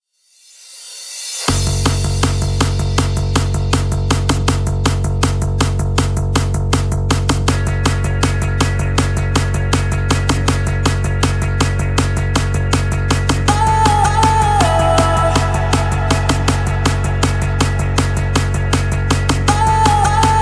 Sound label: Hardstyle Mashups